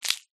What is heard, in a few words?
snail squash crush; zapsplat